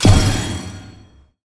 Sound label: Interface Xp Milestone